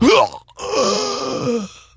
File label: Death; Putress